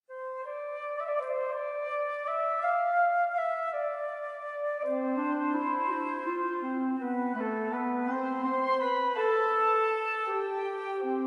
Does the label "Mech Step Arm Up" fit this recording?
No